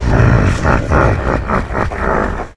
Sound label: balrog neutral; spawners mobs